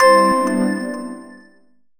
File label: Message